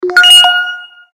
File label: Tinker bell, notifications; 三星